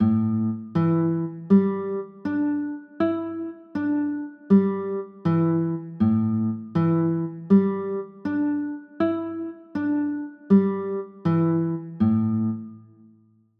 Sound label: a sus4, chords